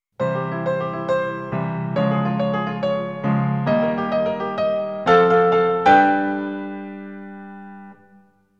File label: World Clear Fanfare; Tanoshii Beyer Heiyou Super Mario World complete music collection 1993